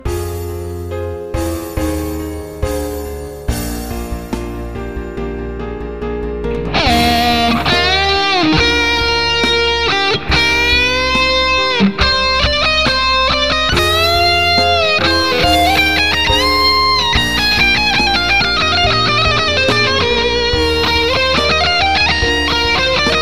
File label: metal